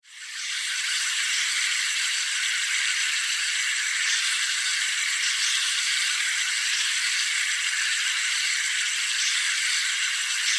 Jungle Day, Ambience, Jungle, Day, Chirps, Insects, Bugs; 5.1; Surround Sound; BlastWave